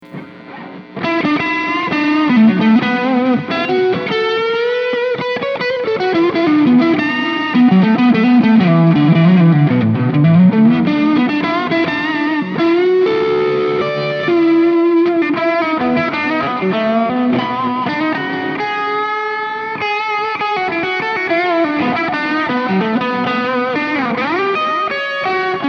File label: g12m speaker